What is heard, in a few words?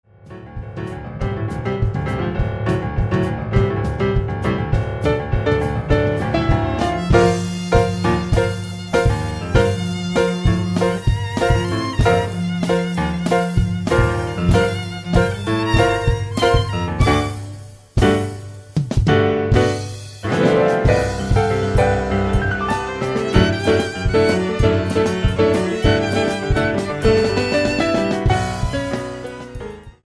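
Exit Music, Live Orchestra Musical Theater Backing Tracks; Dogfight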